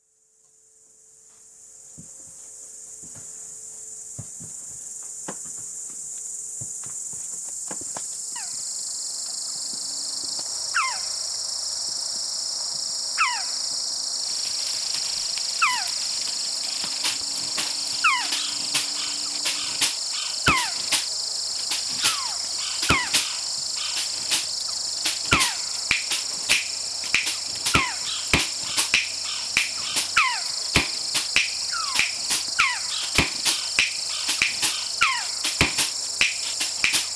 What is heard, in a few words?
Night bird soundscape